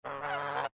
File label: turkey squawk